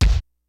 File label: KICKS; Kid Nepro; Elite; KICKS SNARES